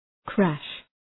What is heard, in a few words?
crash